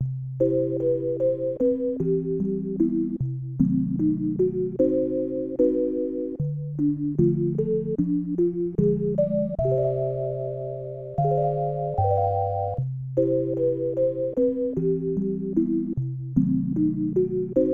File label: Conversation; Yuujin Janjuu Gakuen; snes